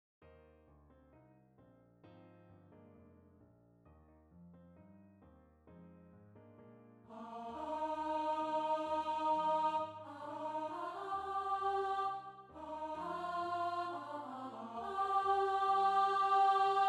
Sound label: alto